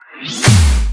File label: Snare